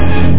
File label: hit; Aminet 21; GTI - Schatztruhe